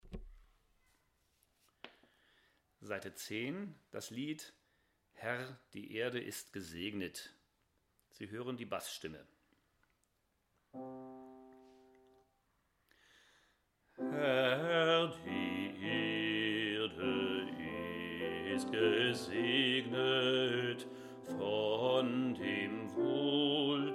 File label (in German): Herr die Erde Bass